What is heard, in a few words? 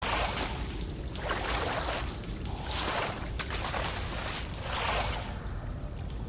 swimming; kena bridge of spirits